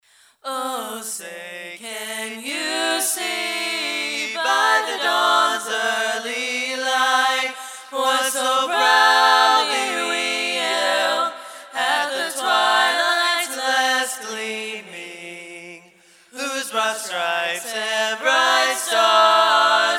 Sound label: National Anthem Trio